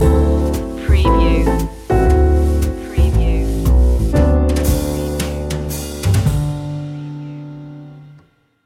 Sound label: silent night jazz stinger